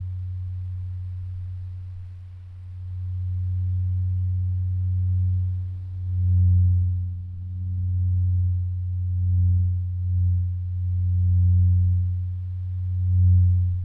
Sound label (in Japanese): 扇風機の音